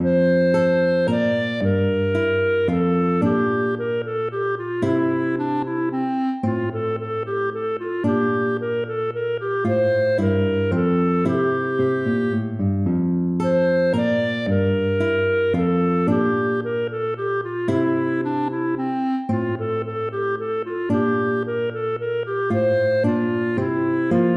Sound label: minuet major